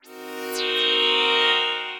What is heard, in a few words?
Synth Deep Pad